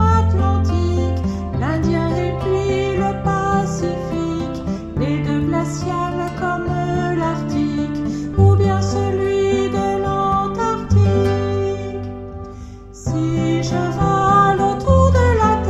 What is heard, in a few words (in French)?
voix